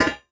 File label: drop metal bars; Rust